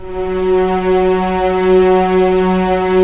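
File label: STR SC, strings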